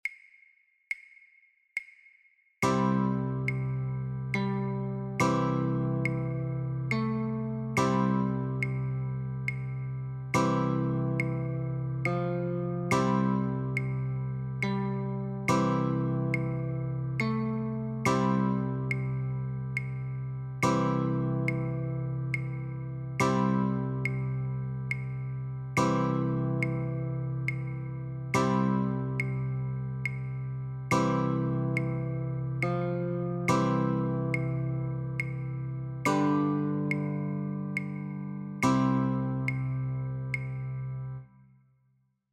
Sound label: Notes and Chords, guitar; Ring around the rosie